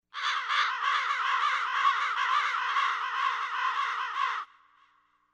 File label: Crow message